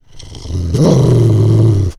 wolf growl; The Elder Scrolls III - Morrowind; were

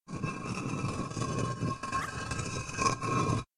Deep Rumble Walls; SyrinScape; Hunting horror battle

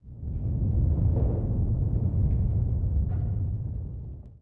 rumble; The Elder Scrolls III - Morrowind

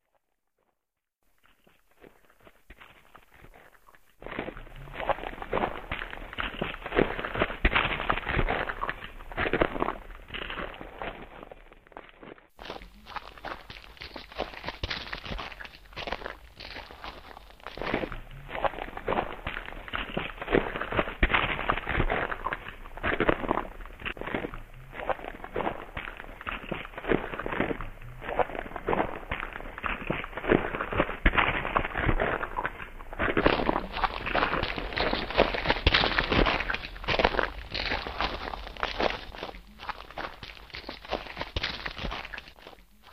Steps on gravel; SyrinScape; Clockwork entities

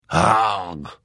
Hit, Vo

